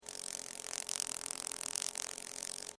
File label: Insect Wings Fly, Insect, Wings, Fly, By, Bug; Blastwave; Animals:Insects